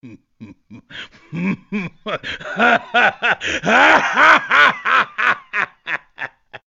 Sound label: Ganondorf laugh; legend of zelda the wind waker